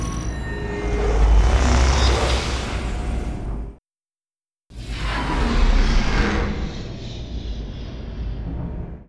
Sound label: Prysmatic Shield